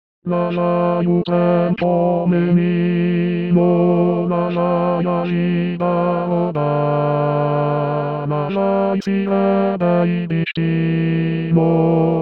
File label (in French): bas; O trenzinho do Caipira